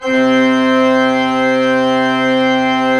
ORG, CHURCH ORGAN; Roland - LA Composer vol. 1

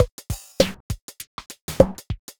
shufflin beat; Sonic Foundry (Sony Creative Software); Ugly Remnants VOL-2

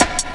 Old School Cut, Jungle, Drum Loops 168.5; Zero-G Jungle Warfare 1, 2 & 3

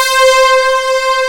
BRASS WAVE C4

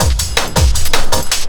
LOOP L, Drum & Bass 160 BPM

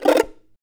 CHARANGO FX

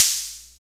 FX analog; Sound & Vision - Gigapack II

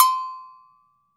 AGOGO BELL, Brazil; AKAI S6000 CD-ROM - Volume 5

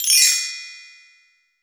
Bell Tree; Roland BK-5; Percussion

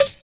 DRM OLD, dance synth

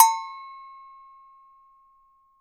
LOW AGOGO, BRAZIL

